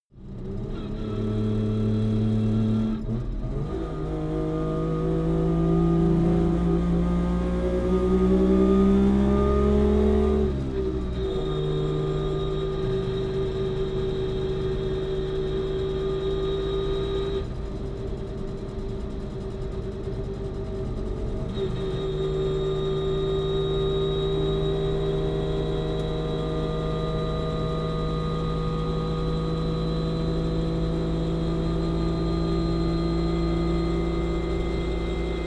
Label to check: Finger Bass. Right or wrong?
Wrong